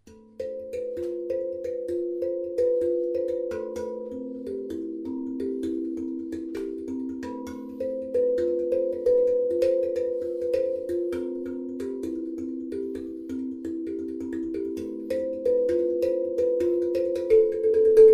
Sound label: Ionian impro hands